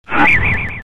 suoneria botton; beep

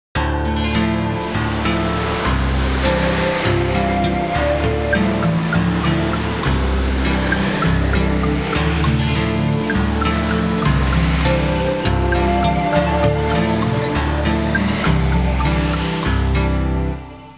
musica elettronica; Suoneria

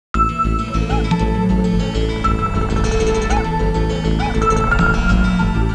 musica elettronica

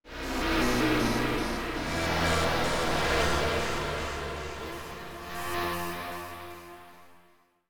suoneria botton; beep

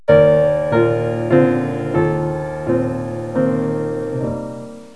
suonerie classica; musica classical

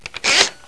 suonerie businessy

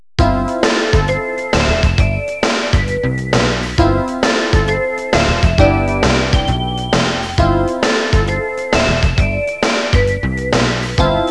suonerie businessy

